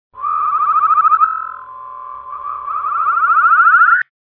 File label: MARTIAN WHISTLE